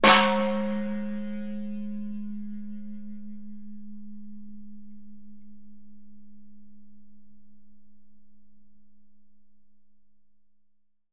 Khmer Temple Bell, bell towers

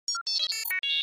Device Act Button, computers

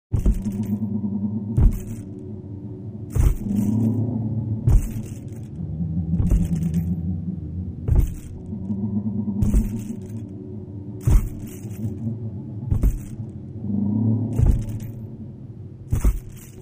Spirit Ghost Heavy

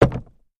Carriage Footplates

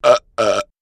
Sharp Dbl Belch

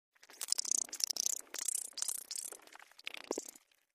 Insect Mvmt Vocal, beetles

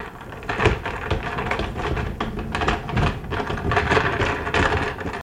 Chop Block Rolling, Wheels

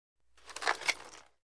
Roller Blading; Roller Blades Being Picked Up.

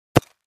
Concrete Chunk Hit

